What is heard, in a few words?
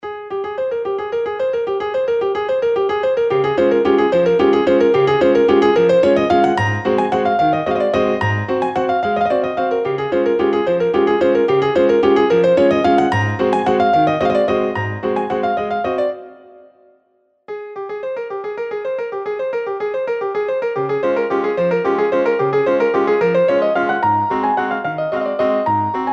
Acoustic Pianos; DSK